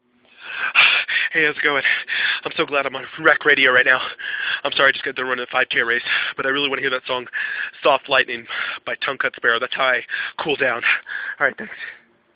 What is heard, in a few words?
Soft Lightning